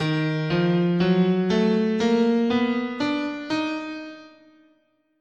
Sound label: D sharp Hungarian Gypsy, scale